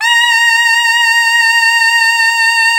mizmar

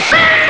ahh; Amiga Soundtracker Sample Packs

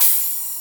CYMBAL, Drum Machines Akai MPC2500

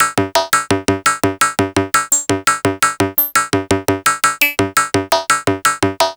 MINIMOOG; AMG - Vince Clarke Lucky Bastard

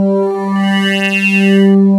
PPG LOOP; E-MU Formula 4000 Series Vol. 3 – Analog Odyssey; VK+ Instruments

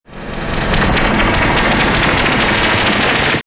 Alien Applause, sci fi synth fx; X-Static Goldmine

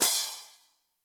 Ride, Yamaha RY20

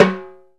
LO TMB CNT, Timbales; E-MU Producer Series Vol. 2 – More Studio Essentials; Composer; Timbales Xswitch

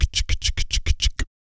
VOC PERC, Vocal Planet Jazz FX BASS PERC

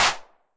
HAND CLAP, 909 Reverb

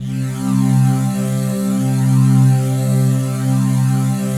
PAD, Dance Mega Synth

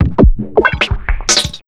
150, Big Beat Drumloops

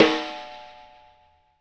CAIXA RM, Brazil

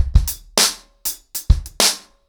100BPM, ACOUSTIC DRUMS

